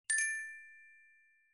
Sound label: success